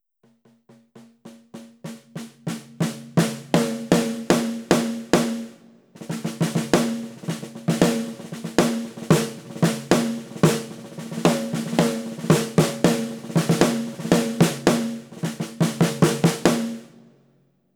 rock snare; Gretsch 14" USA Maple Snare; professionally recorded acoustic drums

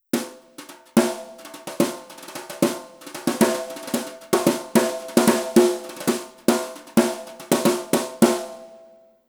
brushes bop snare

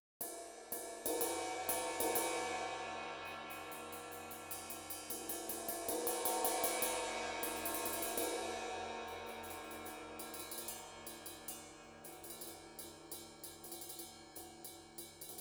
brush 20 ride and bell; Brushes on 20 Inch Ride and Bell; professionally recorded acoustic drums

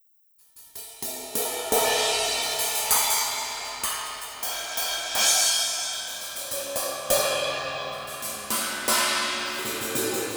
brushes cymbals; Brushes on Various Cymbals; professionally recorded acoustic drums

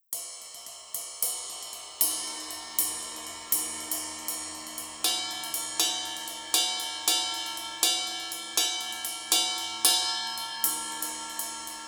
20in ride; 20" Ride & Bell

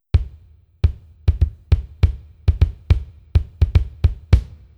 full kick, drum samples; Gretsch 20" USA Maple Kick

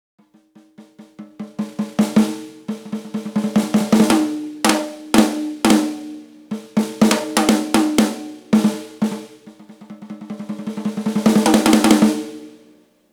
snare 12 orleans; 12 Inch Orleans Snare; professionally recorded acoustic drums

